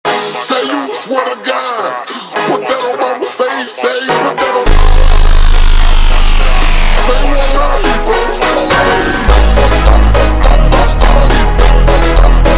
Funk super Slowed

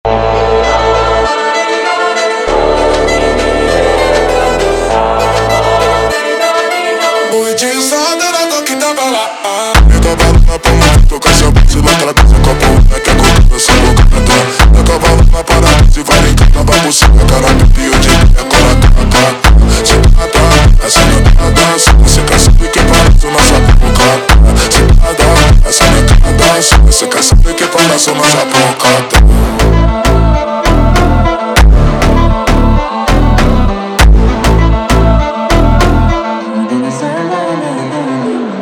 Funk Risada slowed

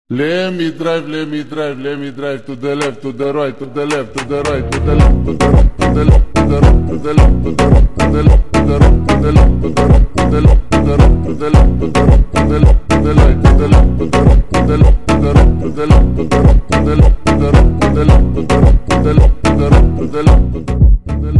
Funk super Slowed, funk

